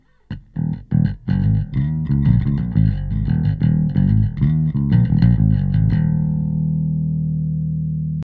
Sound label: bass default